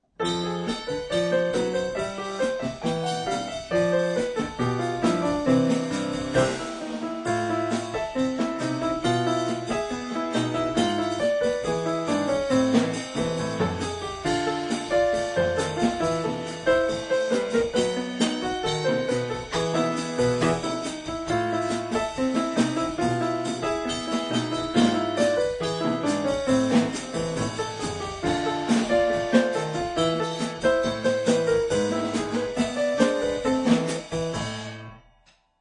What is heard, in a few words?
piano drums